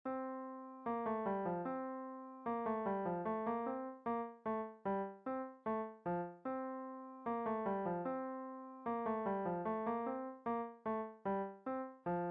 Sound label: Musette; Flat example; Bach